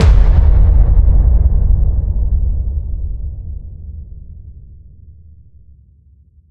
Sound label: Boom, cinematic boom